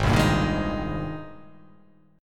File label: Ab Major 9th Ab down, chord; AbM9; strummed